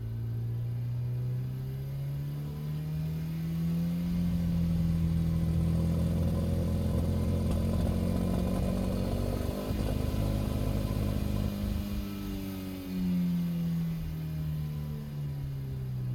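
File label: engine high loop; Blind Drive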